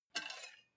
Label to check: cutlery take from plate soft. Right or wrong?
Right